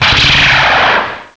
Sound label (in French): Cri